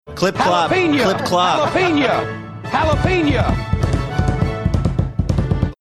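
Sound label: saying clip clop drumming horse trotting rhythm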